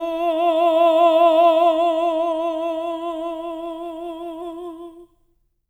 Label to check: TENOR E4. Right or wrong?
Right